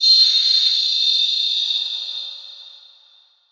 cell Idle; Leucocyte; CARRIER

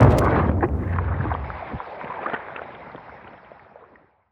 explosion, Hammerhead matriarch

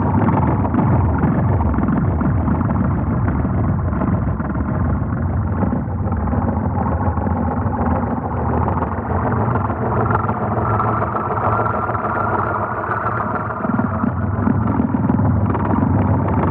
Alien Pump

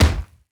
Limb Blunt; Damage

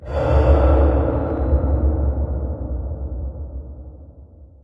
Ambient

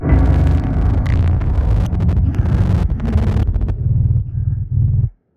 death; Barotrauma; Watcher

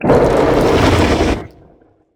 dead, Spineling giant

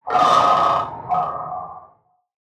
FRACTAL GUARDIAN death; Legacy

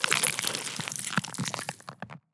Gore; Damage

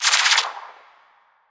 attack Projectile; Spineling

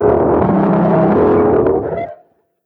attack; Barotrauma; Bonethresher

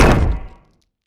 Damage alien Ruins Wall

